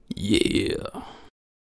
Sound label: Attack Vocals; Tank